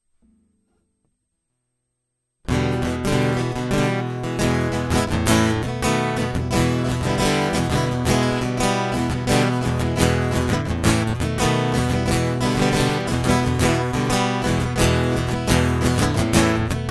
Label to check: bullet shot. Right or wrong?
Wrong